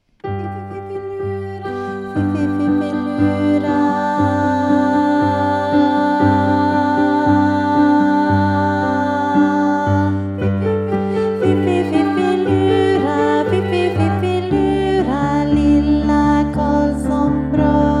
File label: Alto; Fififilura; Opp och ner